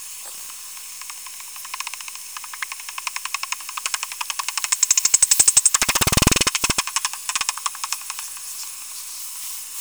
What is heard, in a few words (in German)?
Zwergfledermaus